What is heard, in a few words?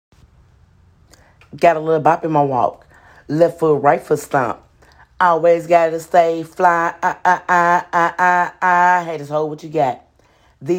rap 136 Fm, Acapellas